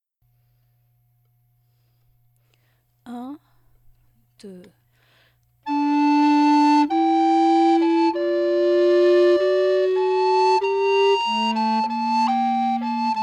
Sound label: Paisbl A Bass